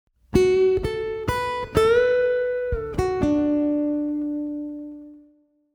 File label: bend phrase, guitar string bending